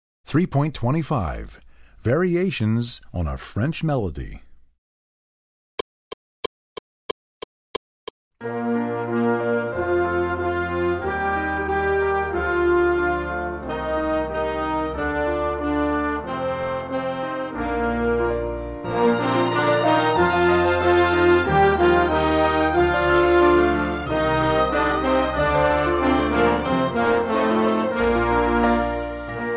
Variations on a French Melody

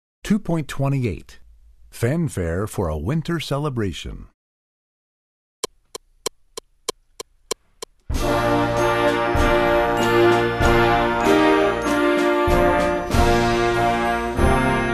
Fanfare

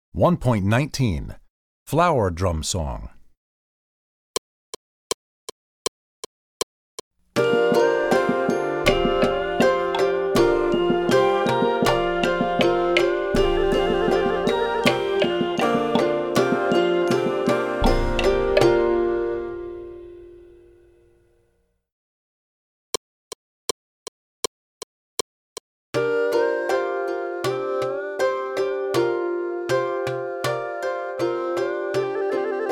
Percussion; Percussion Book 2

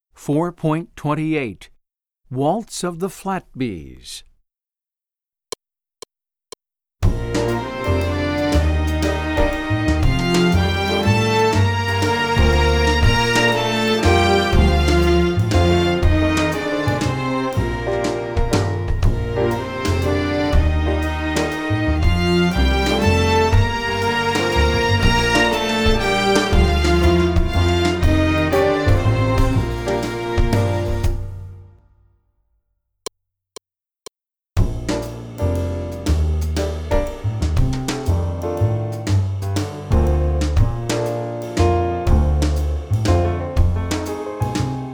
Waltz

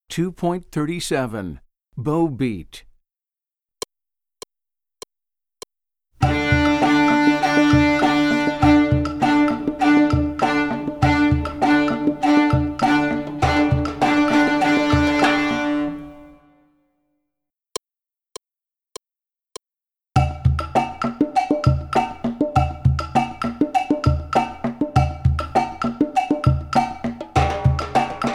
Bow Beat

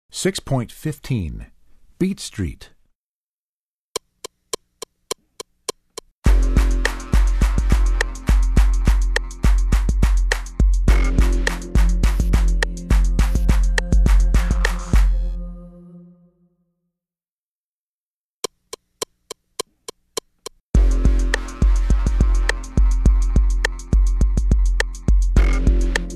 Beat Street, Percussion; Percussion Book 1

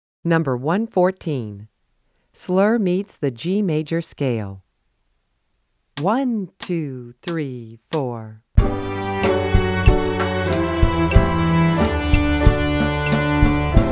Slur Meets The G Major Scale

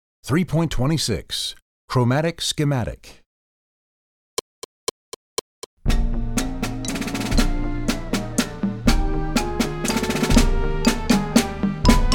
Percussion; Percussion Book 2

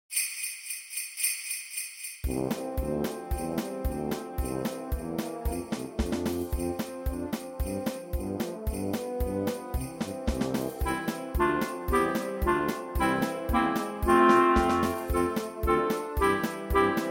Jingle Bells Accomp

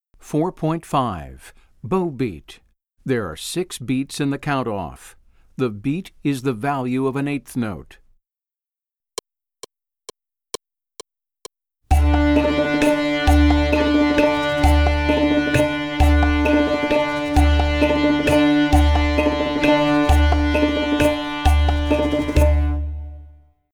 Bow Beat

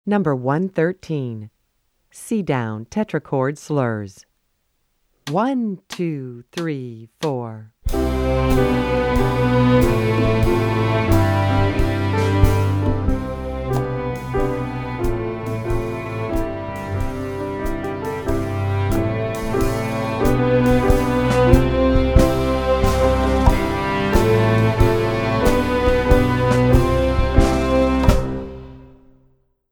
C Down Tetrachord Slurs

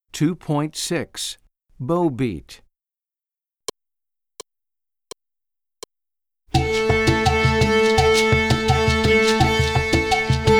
Bow Beat

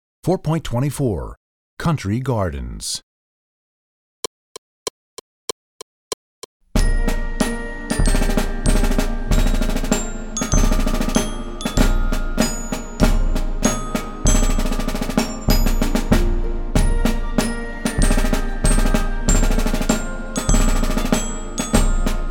Percussion; Country Gardens; Percussion Book 2

